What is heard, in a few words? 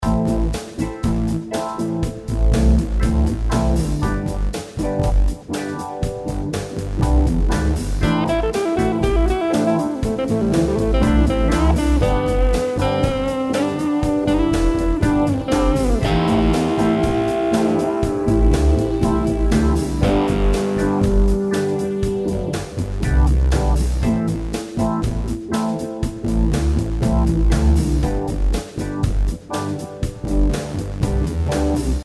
Pencil Racer Driving loop